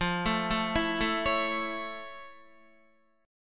F Augmented Major 7th F Guitar; F+M7; arpeggiated

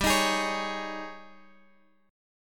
Ab Major 7th Suspended 4th G

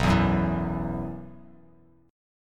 B Suspended 4th, Bass Standard; Bsus4 strummed; JGuitar

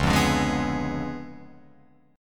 C sharp 7th Flat 9th, Guitar, Open F, C#7b9 chord; strummed; JGuitar

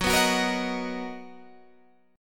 B Major 7th F sharp, chord

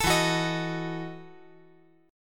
E Major 7th Suspended 2nd Suspended 4th

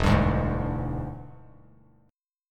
E 7th Sharp 9th E down Bass Standard; E7#9 strummed; JGuitar

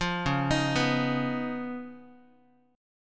Db 9th Db down Guitar; JGuitar

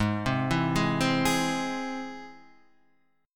G sharp Augmented, Guitar, Half Step Down

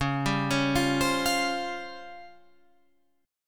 C sharp Major 7th Flat 5th, chord

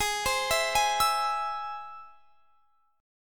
Ab Augmented Ab; x,23,22,21,21,24; Ab+ arpeggiated

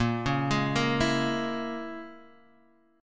Bb Minor 7th Flat 5th, Guitar, Half Step Down

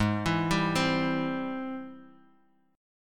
Ab Augmented Major 7th Ab; arpeggiated; 16,19,17,17; JGuitar

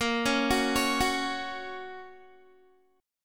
Bb Minor Sharp 5th Bb down Guitar; Bbm#5 arpeggiated

